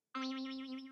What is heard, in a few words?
Funny Boing